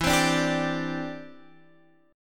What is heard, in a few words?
E Minor 7th Sharp 5th E down Guitar Open F; strummed; JGuitar